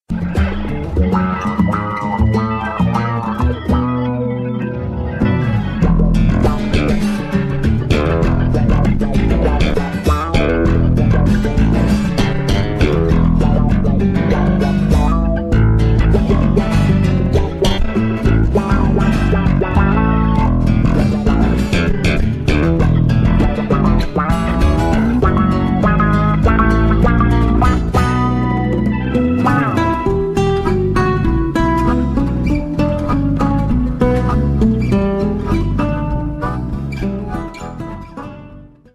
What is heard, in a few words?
Funky Blues